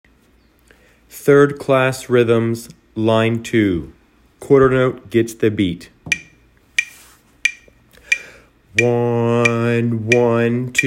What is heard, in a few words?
rhythms